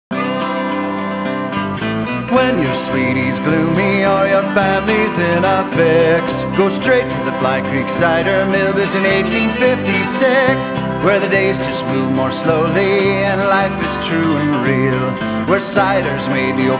jingle

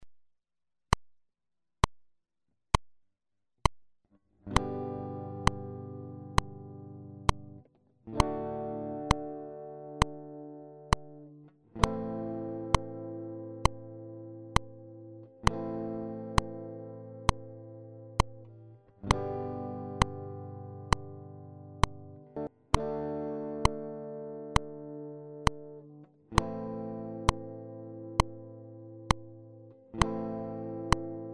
Chord

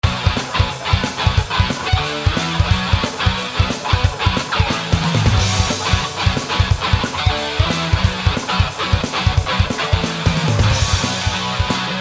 Garage Band Practice

